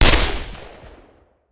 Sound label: Machine Gun; Sound Ideas; Guns